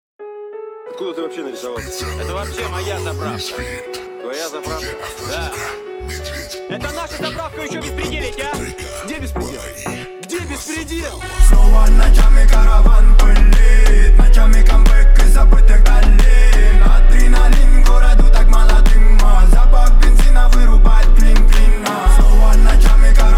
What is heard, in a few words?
29-33 Hz Low Bass